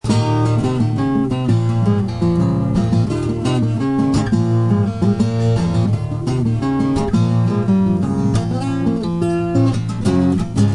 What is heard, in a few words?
jazz bl break, guitare